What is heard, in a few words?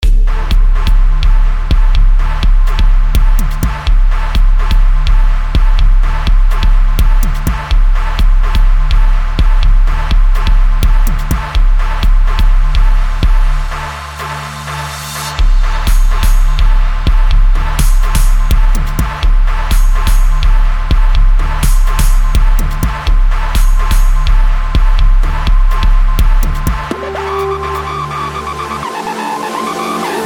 Haunted Bass